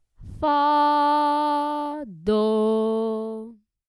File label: subdominant tonic